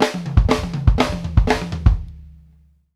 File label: FLAM, drumworks fills; Sampleheads; New York City Drumworks VOL-1; KD FILLS 120